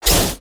preattack; dota; dark seer

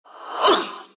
pain; dota; death prophet

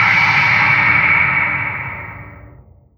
revenants damage; dota; muerta

